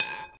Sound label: center Roll Short, roulette; frenchroulette3